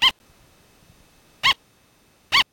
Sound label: bark